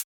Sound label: hihat; Electro; alternative